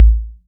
Dubstep Kicks

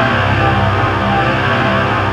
RAW WAVE; OTHER SYNTHS; EMU; Rubber Chicken Software Sample Bank

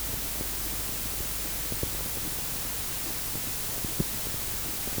Tape Noise

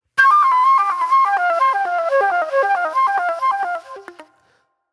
Soul-Jazz Flute, Call And Response

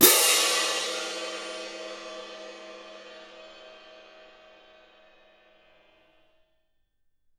CYM F -L, Percussion; Miroslav Vitous - Percussion & Harp

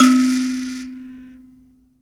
PLK BUZZ, Buzz Kalimba, Africa; Roland LCDP11 Africa VOL-1; Buzz & HiBz

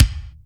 Kick, Akai MPC500

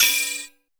MATERIAL, FILM PERC; Drums In Your FACE!

